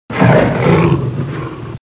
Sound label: Cougar growl